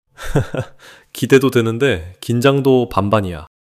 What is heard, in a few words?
laughter